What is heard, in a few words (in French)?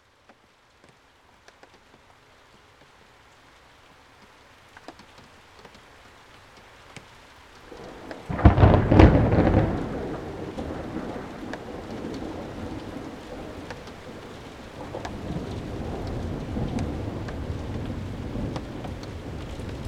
orage